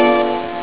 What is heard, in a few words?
long beep